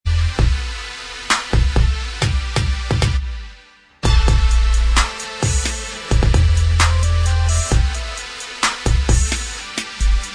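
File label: instrumental, track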